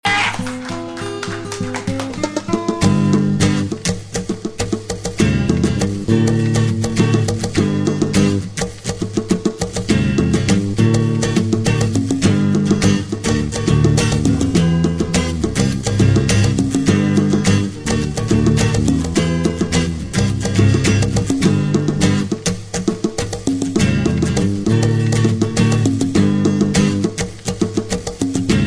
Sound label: acoustic guitar